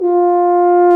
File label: BRS TUBA F; Roland L-CDX-03